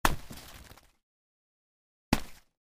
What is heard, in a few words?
Throw snowballs